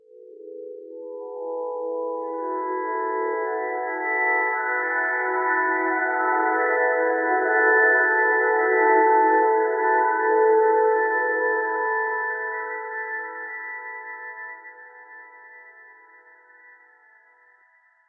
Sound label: Harp